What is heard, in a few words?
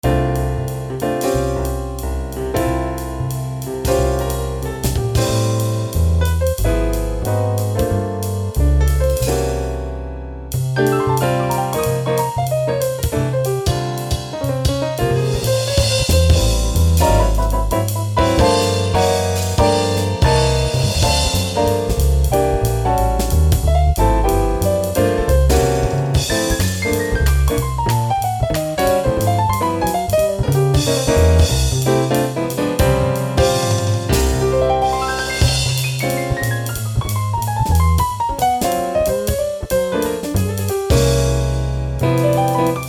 piano tone